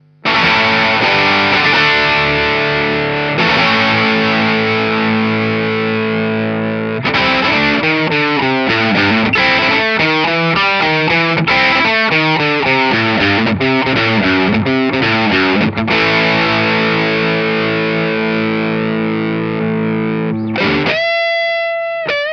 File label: pedal mexico, tele